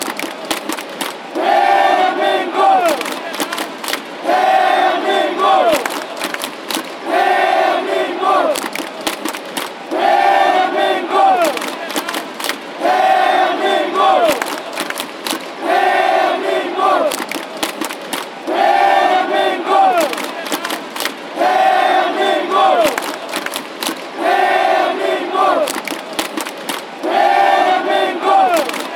fan chants

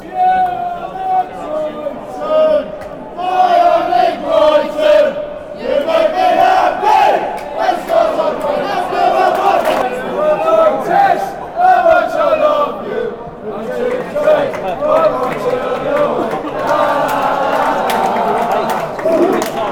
you are my brighton fanchants

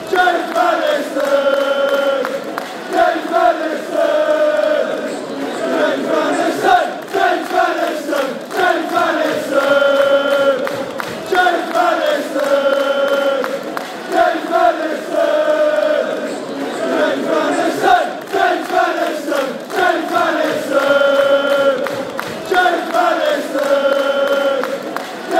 player chant, fan chants; maddison; tottenham

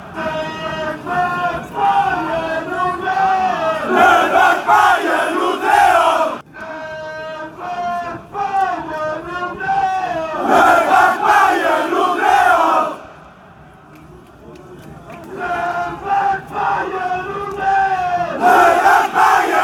shouts lalala ooh, chants

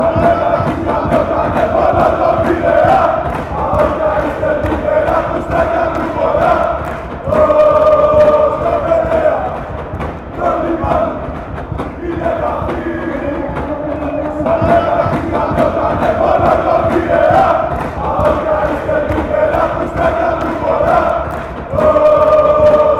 chant, fanchants; olympiacos

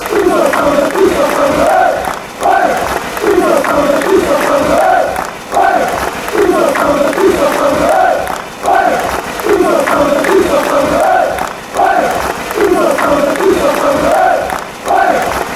all together, fan chants

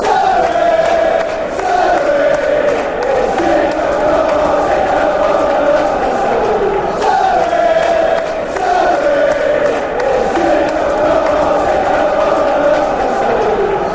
celery celery chant; cheltenham

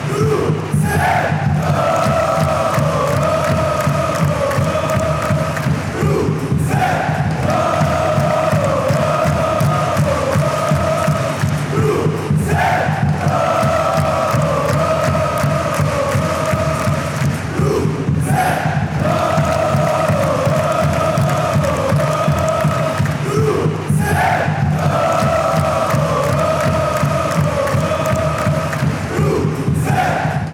chants; cru zei ro

